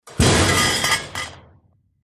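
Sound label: Heavy Metal Tank Smash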